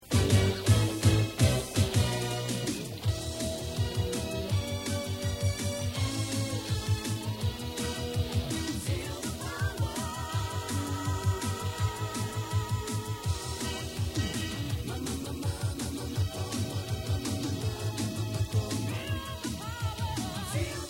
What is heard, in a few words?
end tune